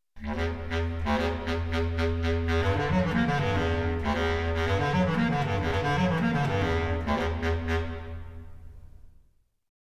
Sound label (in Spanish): ej clarinete bajo